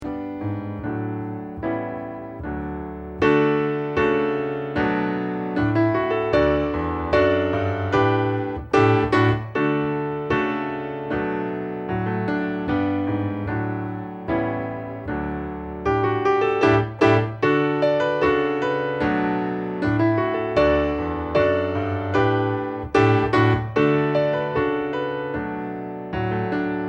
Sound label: accomp